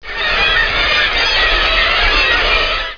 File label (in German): moewen